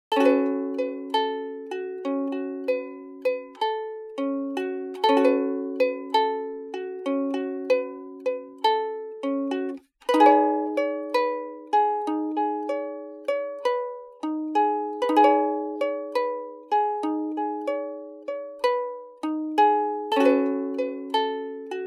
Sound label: ukulele 96 dry; ukulele starter